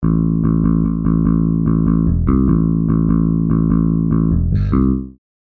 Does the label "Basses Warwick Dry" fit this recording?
Yes